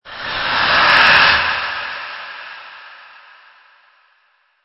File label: Stinger; STINGERS